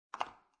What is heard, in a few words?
Foley, Electric Razor, Shaver, Being Placed On Plastic Charger; Set Down